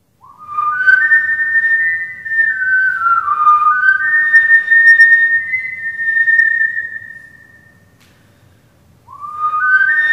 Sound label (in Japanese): kuchibue